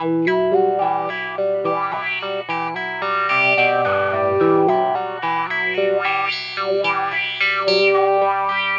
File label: Electric Guitar, Loops; Guitars